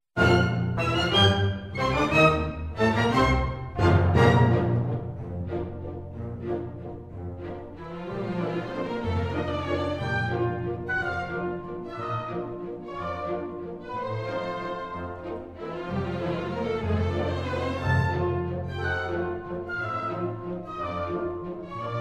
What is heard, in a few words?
waltz